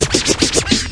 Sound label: snare; Scratching and Turntablism